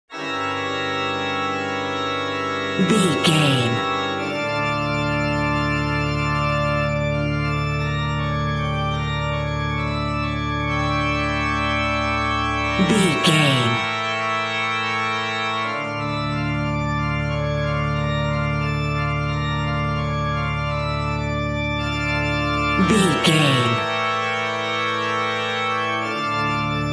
phantom organist

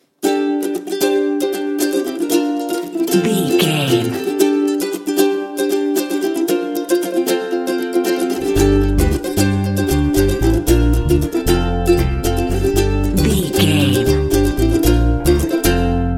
happy ukulele bed

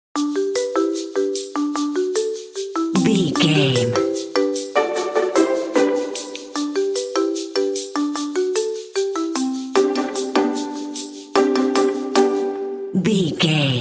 happy go lucky bumper

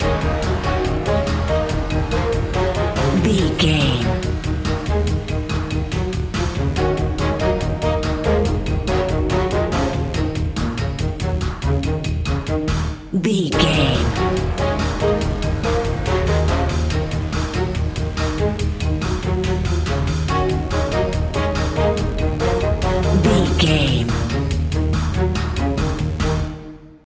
pumped big game loop

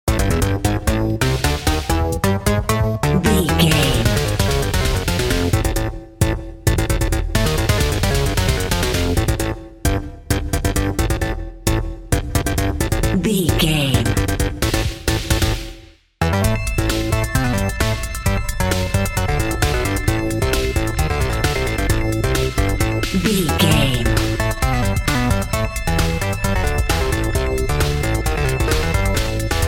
intense video game level

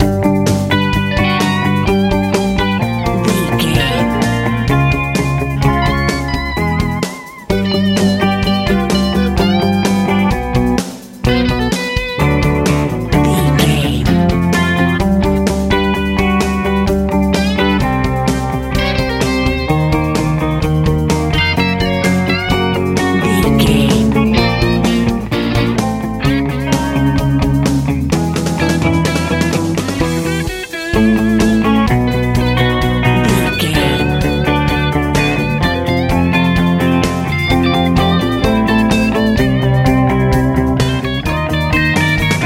new wave

